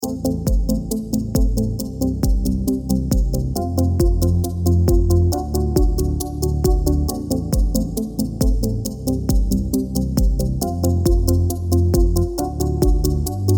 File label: Positive Tick reduced